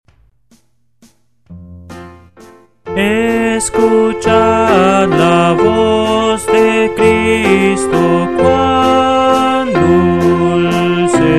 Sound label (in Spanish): Escuchad la voz de Cristo, himnos cristianos